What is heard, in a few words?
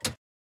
gun reload; World of Tanks